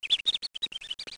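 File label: squirrel; My Science Fair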